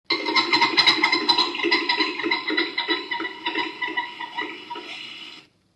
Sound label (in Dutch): Geluid fazant